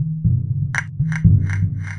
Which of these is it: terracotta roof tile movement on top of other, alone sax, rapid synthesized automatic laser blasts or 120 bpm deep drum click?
120 bpm deep drum click